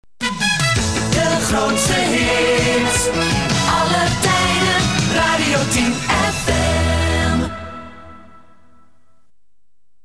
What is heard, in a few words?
jingles; Radio10 FM